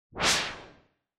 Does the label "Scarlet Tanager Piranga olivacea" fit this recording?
No